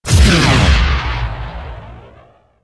player respawn

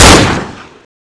glock fire

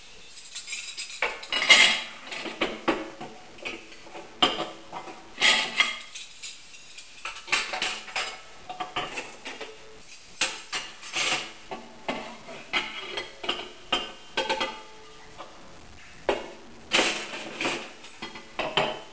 stolovaya; xan-noise

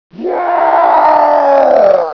grunt die; hgrunt2

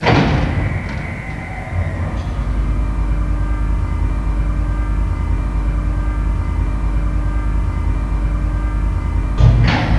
lift

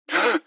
startle, gasmask

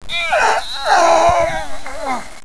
death

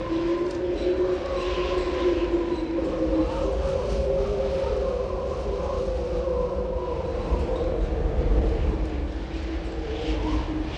wind med

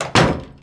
door metal close

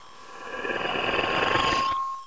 lady attack

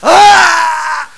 die

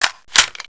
mp5 clip in

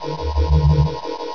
forcefield

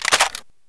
bizon mag in, weapons

